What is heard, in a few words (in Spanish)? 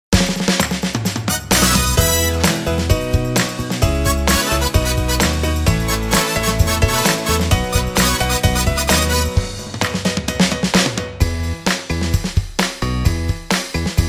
Karaoke